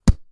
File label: Book Impact